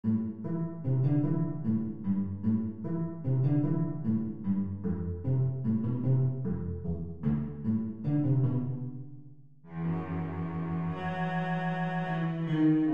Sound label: assez vif cello